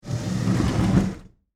Wood Crate Move, Foley, Wood, Crate, Movement, Slide, Large, Box; BlastWave